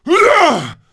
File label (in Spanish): esfuerzo caballero largo; severance